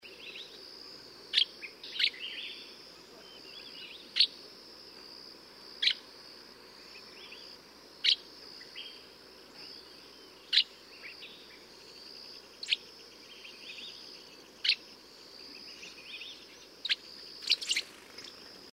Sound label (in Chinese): 山麻雀; 麻雀科/留鳥